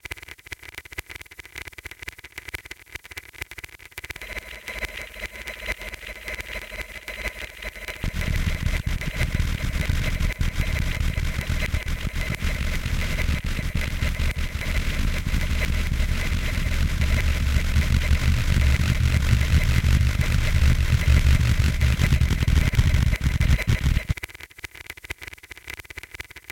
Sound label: hogweed sound; movie by offenhuber